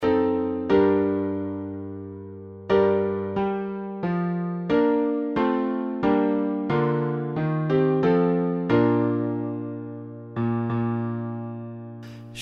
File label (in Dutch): stille nacht sopranen, kerstnummers ingezongen